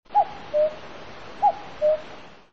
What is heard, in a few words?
cuckoo ring